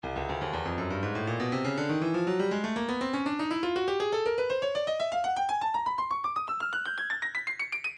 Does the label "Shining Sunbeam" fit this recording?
No